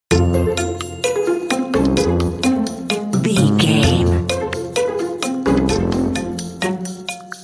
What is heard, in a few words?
silly fun loop